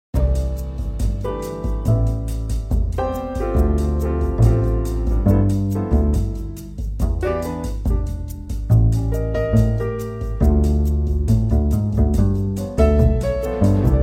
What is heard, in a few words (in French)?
musique fond; sfx